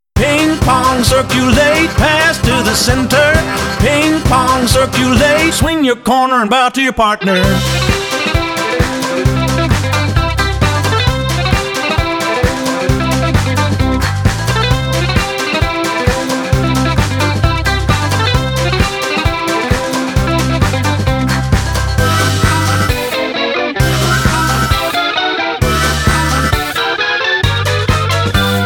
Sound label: hoedown